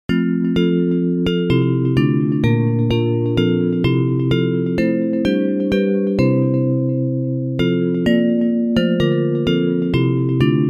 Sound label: Bells, Traditional hymn